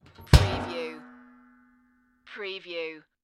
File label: Garbage Can Lid Close